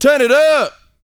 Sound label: VOX F, Vocal Planet R&B & Dance HIP-HOP; ILIO